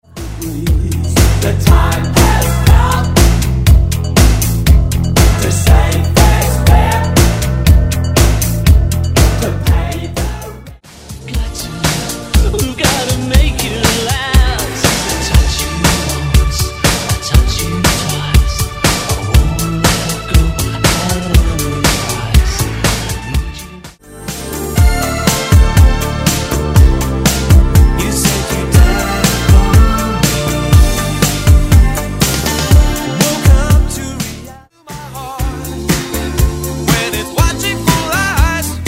80s Rock Funk New Wave Megamix

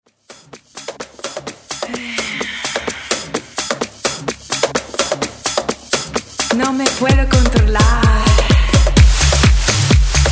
Latin ReDrum 128 Bpm; DJDOLLS

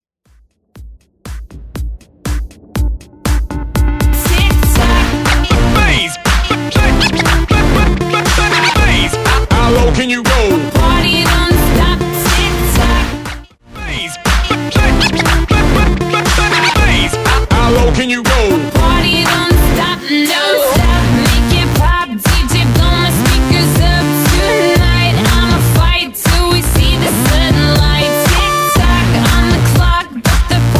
Scratch Redrum

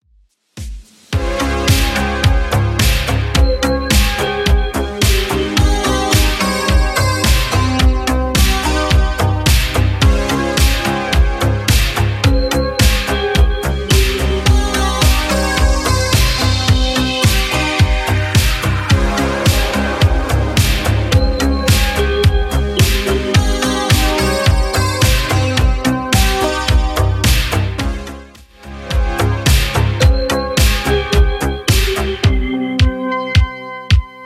80s Redrum 108bpm

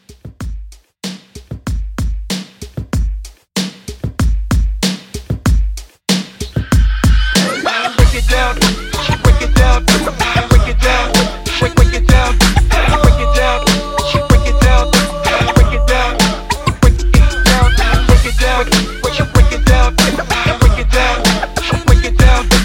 Throwback Hype Re Drum

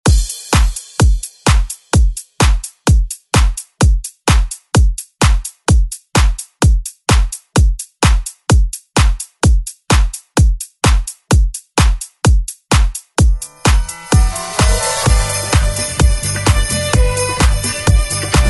70s; ReDrum

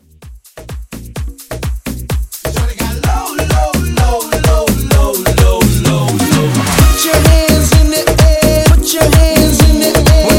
merengue, 128

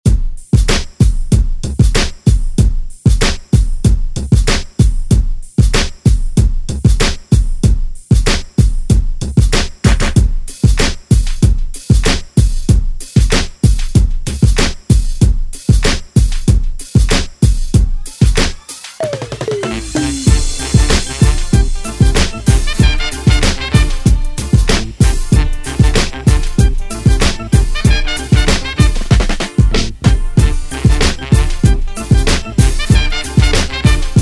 Reggae Ext Redrum

